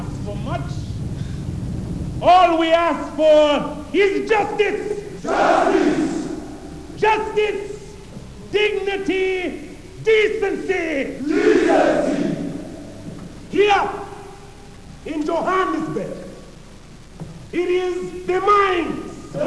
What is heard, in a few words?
cry